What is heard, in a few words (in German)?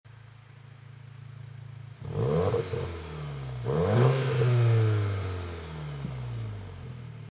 golf 8 r variant geschlossen stand sound, Catback-System Abgasanlage; Normal Modus; apr